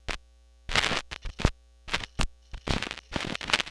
Record Noise Warped, Vinyl Record; Record Noises

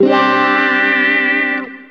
125 c, guitar licks; PS-28 Vocal House Samples; instrumentloops

